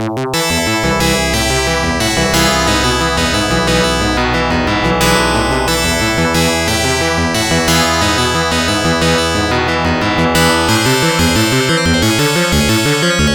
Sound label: arcade stage 1 2 ground; ghosts n goblins